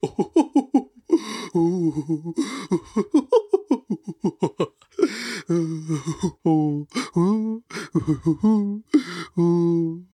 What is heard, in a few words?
Laugh Loop